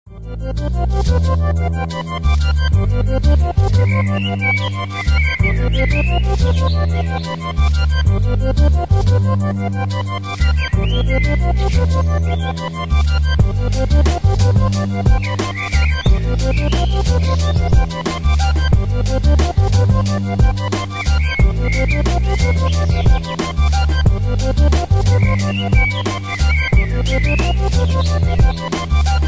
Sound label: chill remix